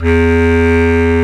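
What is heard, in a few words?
bass clarinet, Orchestral Winds; Roland LCDP04 Orchestral Winds; Lo Clarinets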